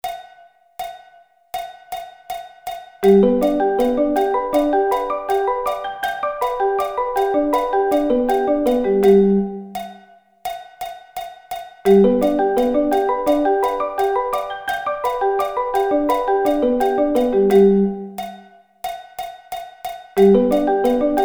G Arpeggio Inversions

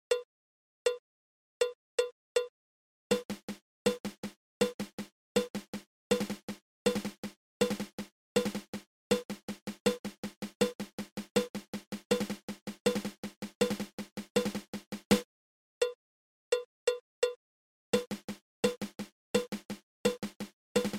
Single Dragadiddle

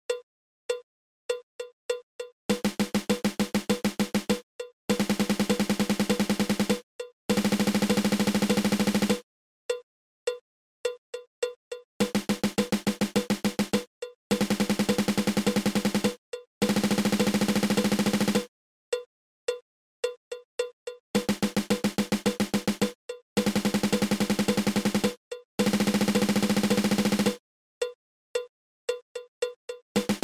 Single Stroke Roll

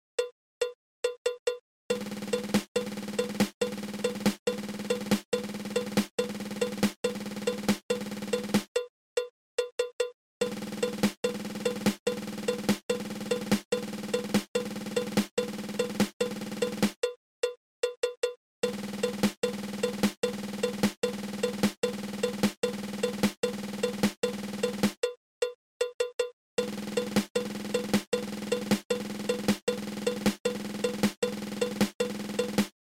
Thirteen Stroke Roll

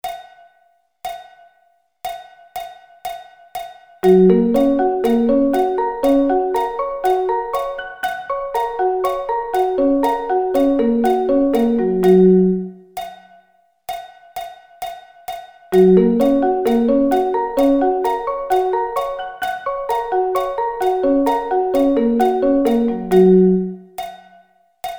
Arpeggio Inversions

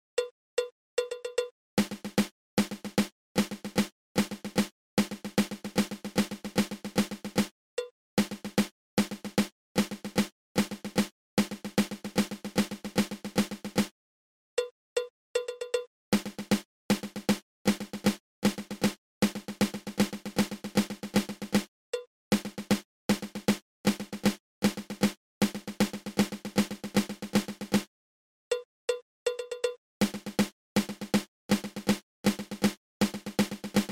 Flam Accent

